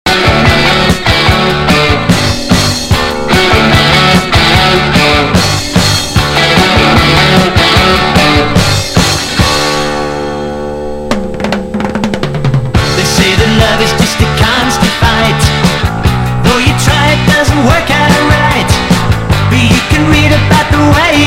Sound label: band